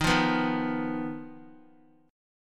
D sharp Suspended 2nd Sharp 5th D sharp, Guitar, chord; strummed; JGuitar